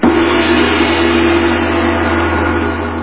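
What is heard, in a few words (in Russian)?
гонг одиночный широкий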